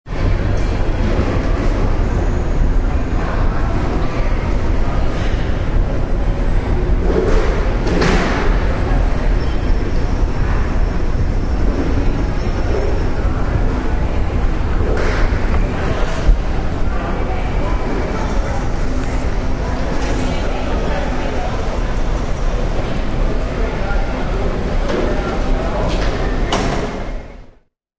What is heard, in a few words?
Background bustling of an airport near check-in.; Airport Ambience; Airports